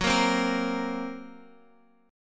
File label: F sharp Major Flat 5th F sharp Guitar Open F; F#Mb5 strummed